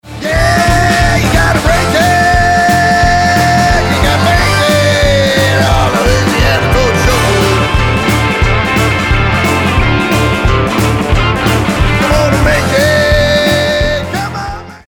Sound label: Shuffle